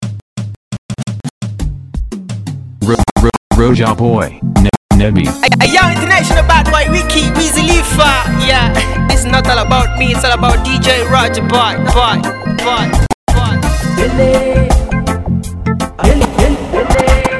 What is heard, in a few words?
Reggae Mix Intro; Alur Club